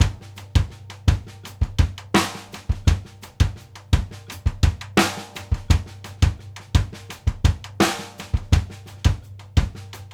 RIM + SNAR, 84; Sampleheads; New York City Drumworks VOL-1; SP ORLNS 84

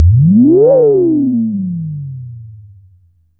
SYNTH FX

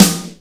BRICK SNARE

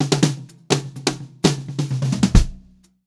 Pop-Rock Drumloops

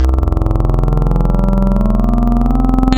HarmoSweep, wavetables; Waves Codex

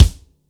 kit, Yamaha Motif-ES8

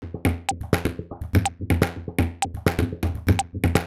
Top F# 124bpm, House Drumloops; Zero-G House Fabrik Drumloops

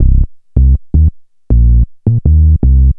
Bass, Futurist Drum & Bass

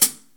HAT

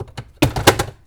naaaahhh

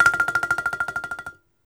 BALIFON, Pitched Percussn; E-MU Producer Series Vol. 7 – Old World Instruments

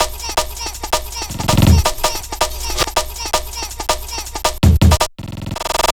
Drum Loops, Jungle, 162; Think B

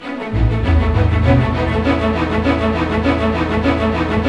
BIKE; East West; Scoring Tools; ORC; Bike Ride

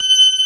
B3 G#5, organ; VOCAL ORGAN; ORGAN #2

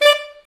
SAX A. STAB, SAX Alto Section; Roland LCDP07 Super Sax; Alto Sect FX

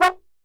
F#2, Brass Super Section, TRMB SHOT; Best Service; 90 s Sample CDs

